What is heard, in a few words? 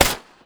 Pistol Fire; Feel; NiceVibrations